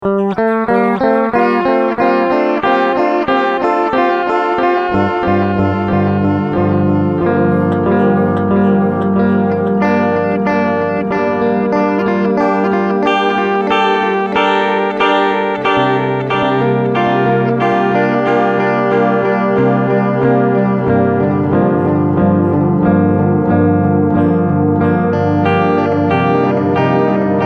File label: MOD